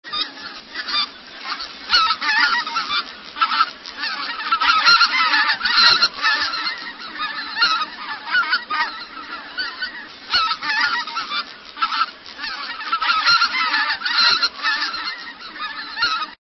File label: Greater White fronted Goose